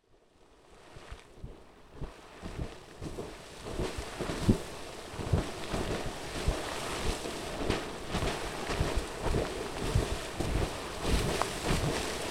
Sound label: Sawmill Geyser